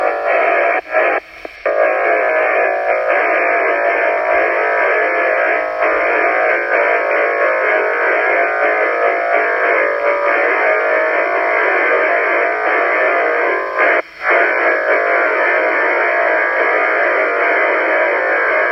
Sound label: noise; SMLRS